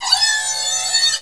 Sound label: zoom; Gunlok